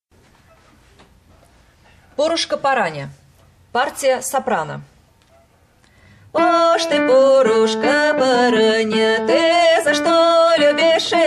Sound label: soprano; Porushka porania